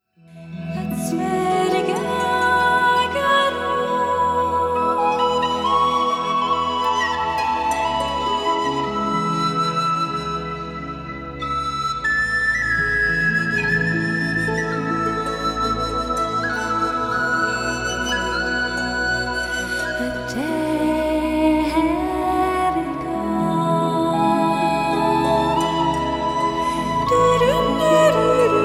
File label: Whistle